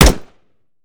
mike4 fire, weapons; gmod; viper

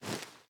reload empty cloth; reloads; gmod; iw8

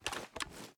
reload xmag start; gmod

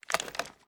reload xmag stock up; gmod; pi mike

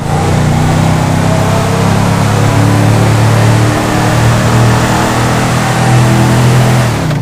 third, vehicles; gtav

